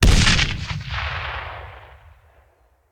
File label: Grenade Hand; Sound Ideas; Guns